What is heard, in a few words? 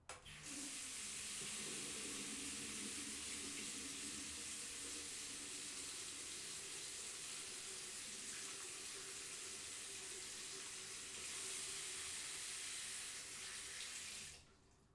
Bathroom Sink On Run Off; Fairlight Sound Lib